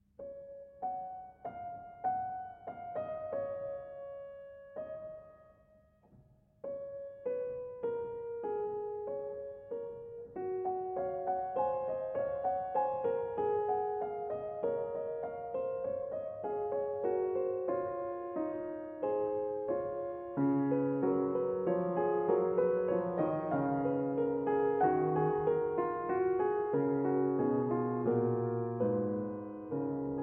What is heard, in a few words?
Fugue in F-Sharp Major; Prelude & Fugue in F-Sharp Major, BWV 858